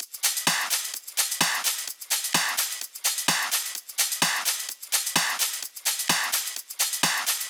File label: Loops 128BPM, Drumloops